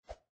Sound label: Jumping in game; پریدن در بازی